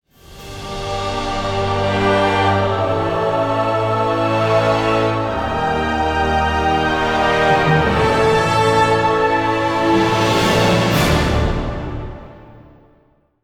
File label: Volcano Music